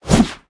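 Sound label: sword clave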